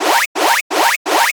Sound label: Arcade Alarm, Video Game Sounds; 1001 Sound Effects